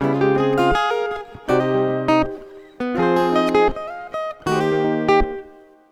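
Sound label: Acoustic Guitar 81bpm F minor Jazz Folk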